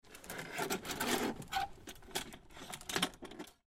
Hangers Slide Metal, Hangers Slide Metal Multiple Clothes Closet; Household